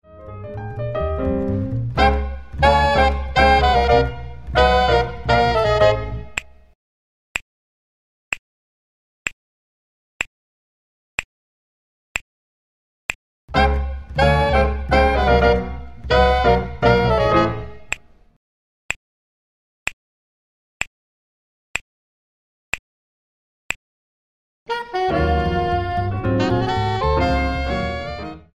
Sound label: minus Drums snaps; snaps on third beat